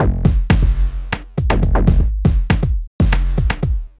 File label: Subtractive Beat